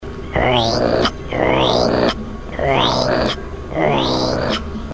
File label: hi growl ring